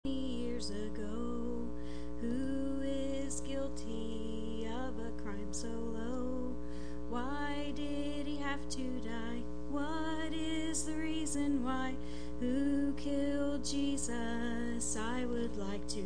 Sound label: singing